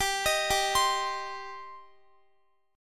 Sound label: G Suspended 4th Sharp 5th G, Guitar; arpeggiated; 4E,4A,5D,5G,5C,6F; x,x,17,20,19,19